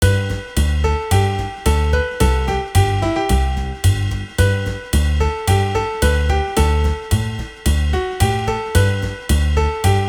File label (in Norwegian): puls og rytme PULS